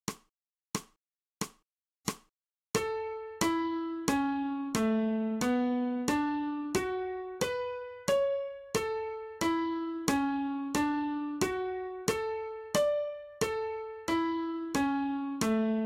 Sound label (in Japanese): 新フレットレス